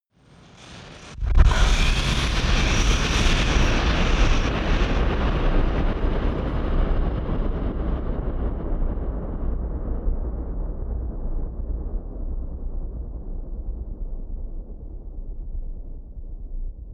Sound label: eerie burst large